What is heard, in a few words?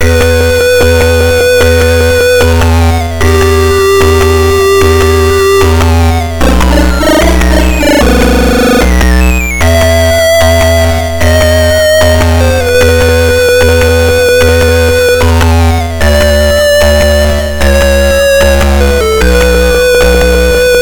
Monster Beat (Stage 2), atari st; r-type gamerip 1988